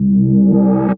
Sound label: C Synth Hit